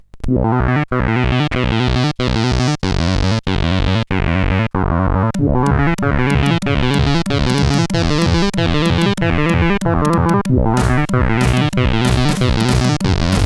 CSG8580R5

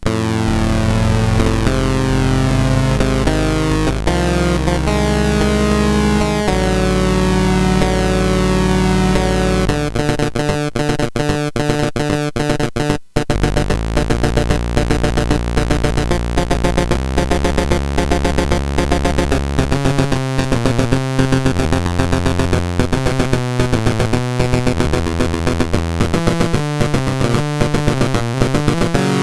Death Knights of Krynn, CSG8580R5

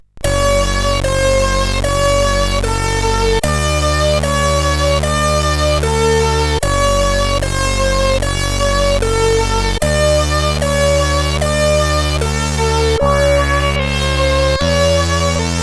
Overture sid CSG8580R5; Stargate

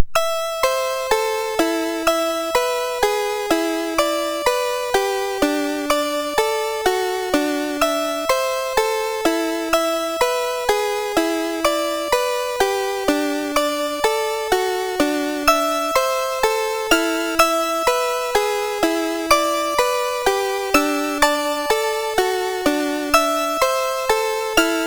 sid, MOS6581R2; Rocket Smash

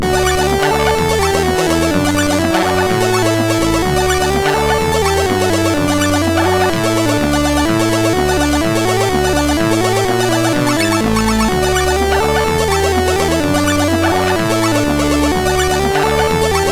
Tune, sid MOS6581R4

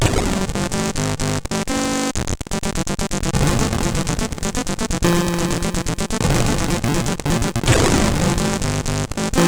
Intro, sid CSG8580R5

